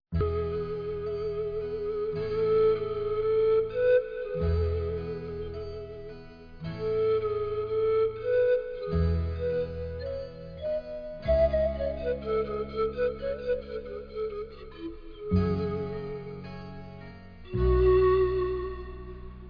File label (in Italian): base musicale